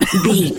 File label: voice male cough short